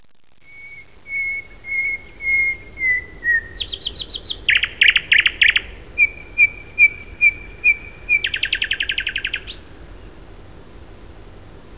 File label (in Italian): Usignolo maggiore, Uccelli; Suoni